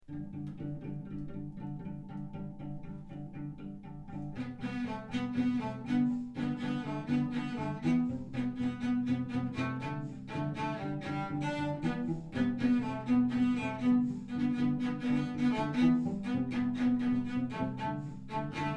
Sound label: Cello Choir